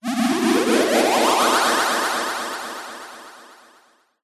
cartoon ascend sci fi warble rise panned delayed

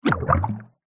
cartoon water movement; zapsplat

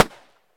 gun tikka t3 battue 308 Swpph Shot Weak

